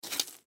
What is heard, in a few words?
Knife Pick Up, Knife Pick Up Dirt; Foley; Pick Up Sound Effects; Objects